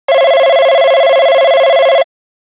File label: tel ring